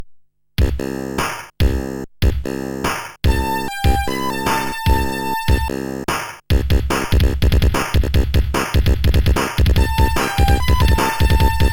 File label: jingles; Customplay; thunder blade